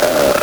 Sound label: LSDj, Nintendo Gameboy; 300 Drum Machines